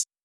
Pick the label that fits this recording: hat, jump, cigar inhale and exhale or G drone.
hat